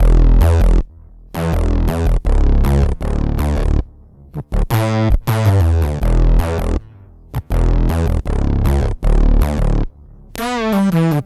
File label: Bass, Synth Bass Loops; Basses; Sonic Foundry (Sony Creative Software); Groove Elements